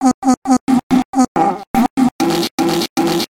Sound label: morse code